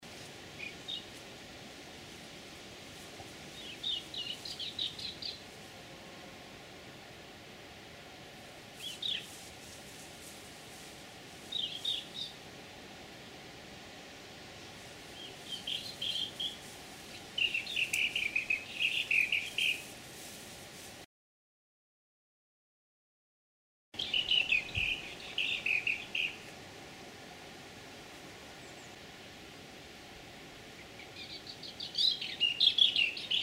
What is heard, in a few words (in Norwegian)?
fuglelyd ukjent